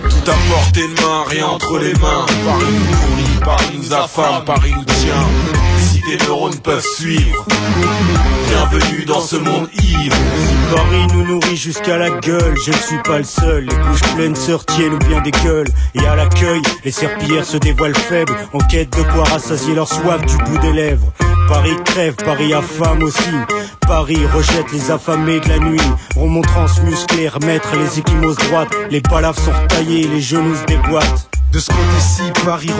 Chanson

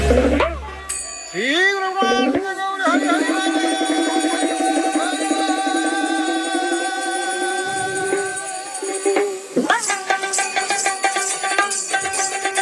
Musique

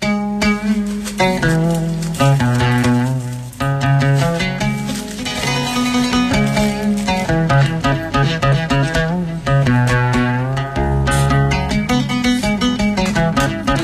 Musique

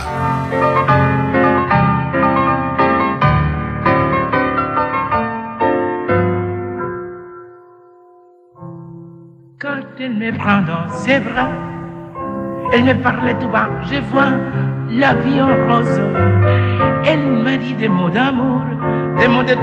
Chanson

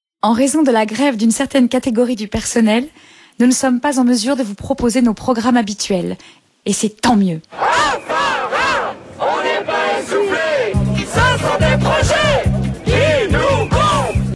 Jingle grève

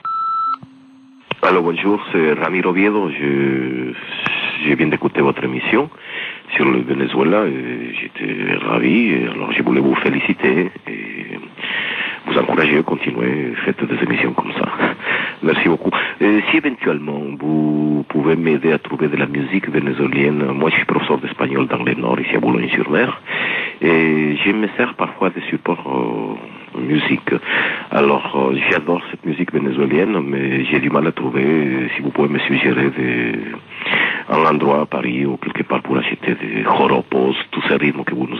Musique vénézuélienne répondeur

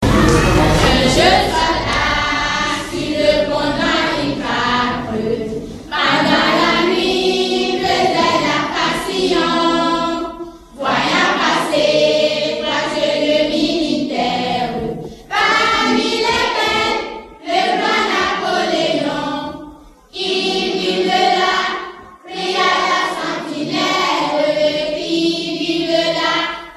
Benin chants enfants

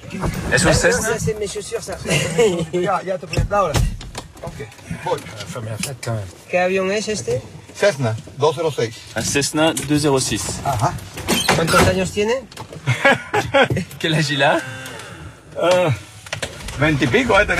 decollage avion; Au Venezuela

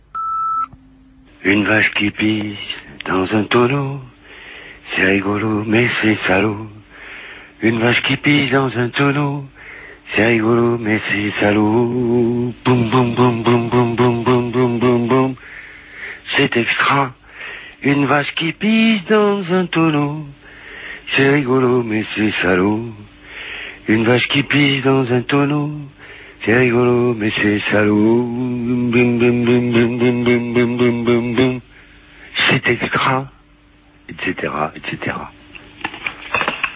Chanson repondeur

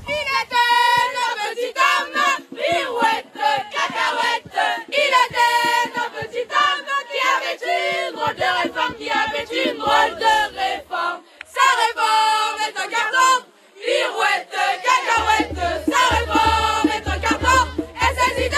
Manif lyceenne chant militant; 8 mars

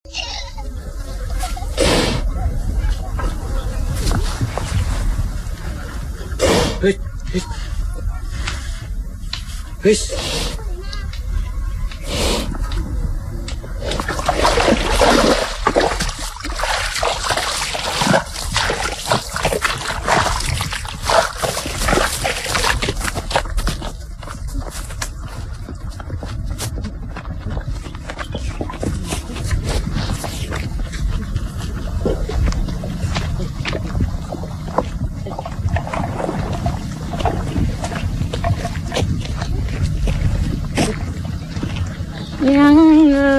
Chanson vietnamienne

